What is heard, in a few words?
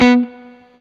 BLU PICK, Guitar & Bass